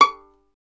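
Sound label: STR violin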